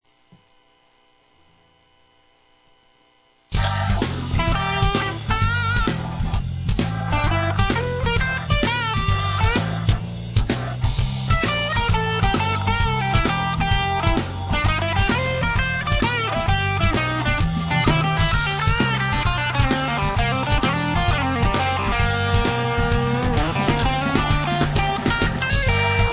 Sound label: blues wankin'